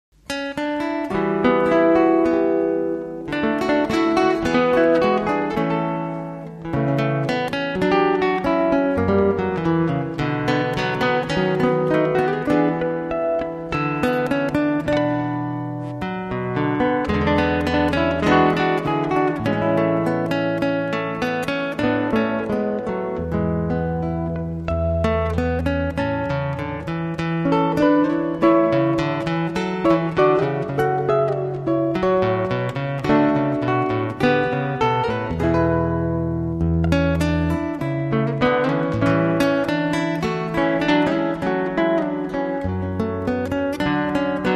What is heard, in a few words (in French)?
instrumental